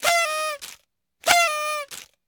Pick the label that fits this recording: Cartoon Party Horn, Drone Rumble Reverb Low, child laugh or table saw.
Cartoon Party Horn